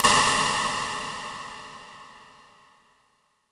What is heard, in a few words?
RIM ROOM; pcm60